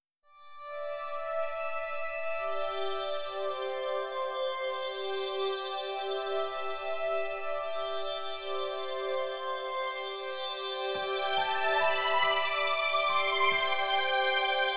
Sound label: Flying Music